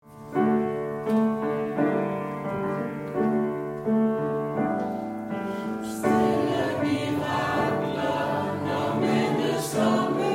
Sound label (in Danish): sopran bas